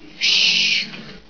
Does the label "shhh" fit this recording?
Yes